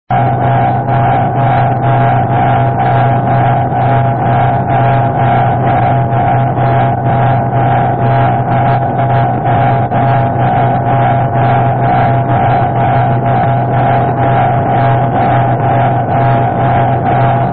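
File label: am, satellite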